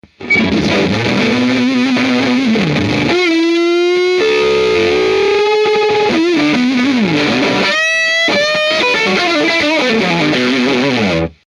Full G Boost; MOLLER

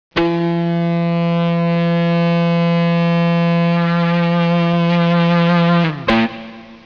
bow sustain

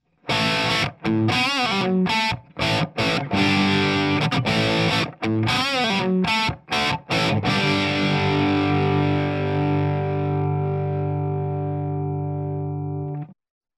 dirty bridge

